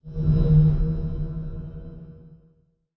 Ability Gooberball Fire; Lurker; Evolve; Gorgon

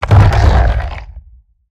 Roll Surface End; Evolve; Behemoth

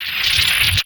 Warp Blast Channeling; Evolve; Wraith

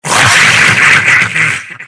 gargoyle bat

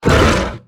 Exertion Light; Evolve; Behemoth

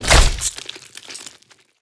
Tongue Grab Hit; Evolve; Behemoth

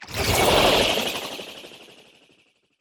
Sniff; Evolve; Wraith

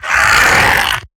Web Sling; Lurker; Evolve; Gorgon

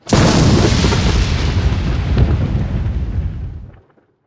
Lightning Strike Fire; Evolve; Kraken

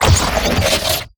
Teleporter Warp Blast Explosion; Evolve; Wraith

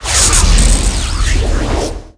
light prep

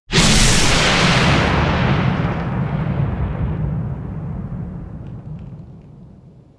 Lightning Strike Impact; Evolve; Kraken

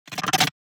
Emote; Evolve; Gorgon

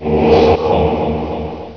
temple; Skeletal Ravager

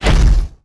Whoosh Arm; Evolve; Behemoth

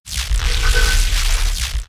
Glide; Evolve; Kraken

